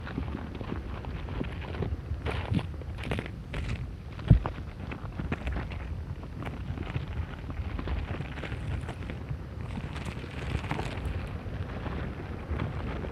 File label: Kelp wrack invertebrates